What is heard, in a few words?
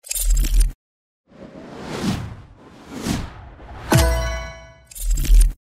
upgrade cashback